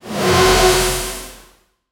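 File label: a success noise like money, slick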